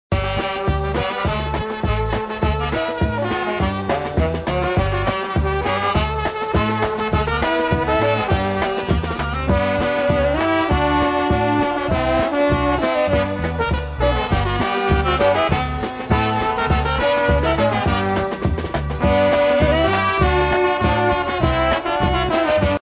polka

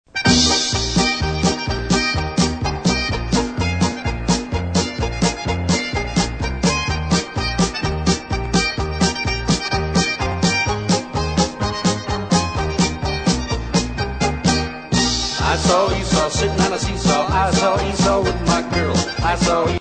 POLKA

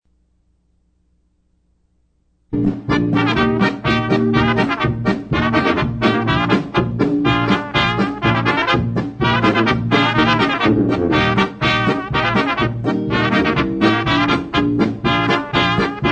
GREY HORSE POLKA

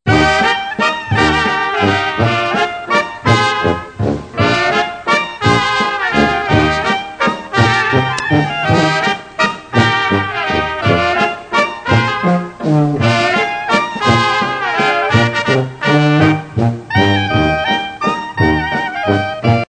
waltz

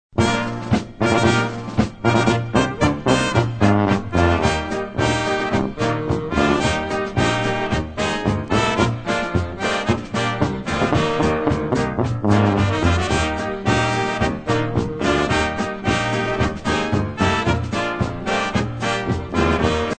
polka

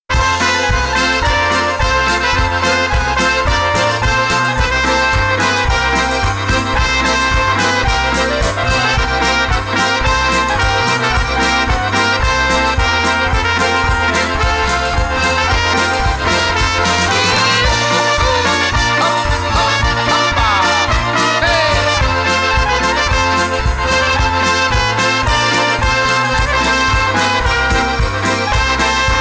Doodle Dee Doo Polka

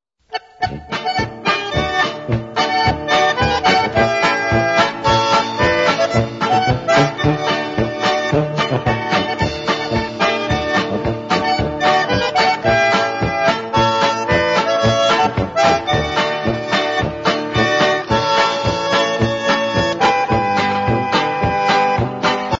SADDLE HORSE POLKA

